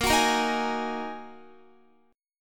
Bb 7th Suspended 4th Sharp 5th Bb Guitar 4E,4A,5D,5G,5C,6F; Bb7sus4#5 strummed; x,13,16,15,15,15